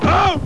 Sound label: Uh; Macworld (1997-09); Games World; Shareware Games; Arcade; SkyDive 1.0